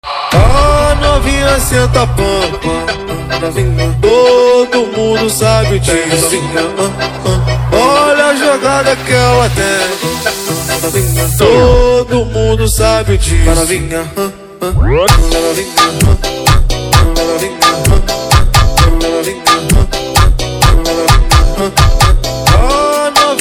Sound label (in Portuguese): piseiro e sertanejo